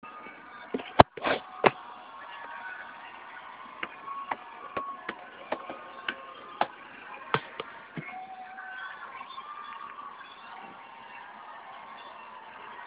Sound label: Sounds heard: sniffling, typing, televi; Nassau Hall